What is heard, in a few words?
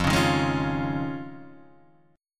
F Minor 7th Sharp 5th Guitar Drop D, chord; 3,4,1,1,2,1; down; chord shape